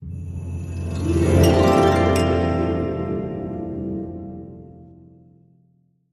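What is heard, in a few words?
Wonderland Intro Crescendo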